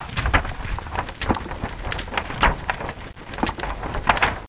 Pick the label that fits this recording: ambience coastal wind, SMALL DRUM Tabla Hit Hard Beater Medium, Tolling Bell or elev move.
elev move